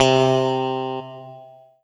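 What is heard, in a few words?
Kawai K11 Clean Guitar C3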